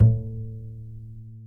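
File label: double harmonic A2, acoustic basses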